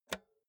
insert xlr multi socket hard; objekte; elektronik

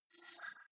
wobble swell constant short; sweeps-swells

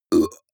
burp grunt short; koerpergeraeusche; menschen; soundpool

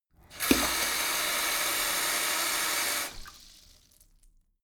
sink fill splatter hard short; haus-garten; badezimmer

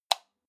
light switch on

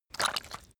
step mud slow normal; schritte

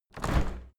window handle open; objekte; fenster

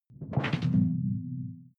sci-fi alien bloom; swooshes-swishes

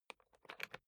briefcase plastic latch lock key turn; industrie wirtschaft; bueroarbeit

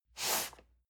cardboard box medium slide short; objekte

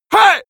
male attack, schreie; menschen

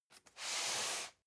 cardboard box medium slide short soft; objekte

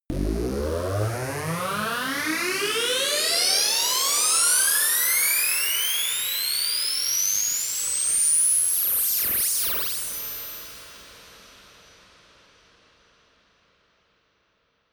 pan mover, risers

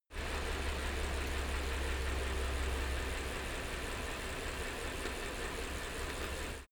bicycle rickety spin

